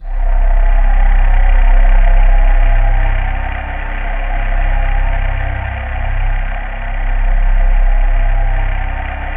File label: C1, Synth Leads